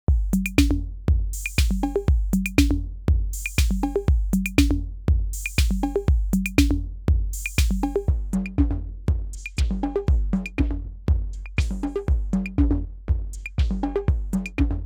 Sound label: FILTER MS 20 Drum Loop; ARTURIA